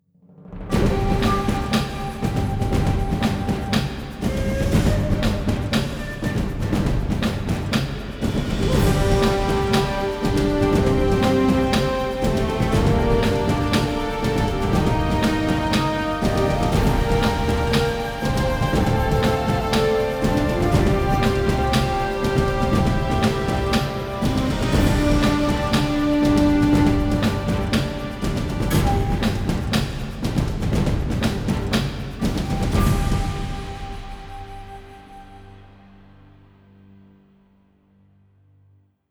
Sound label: japanese victory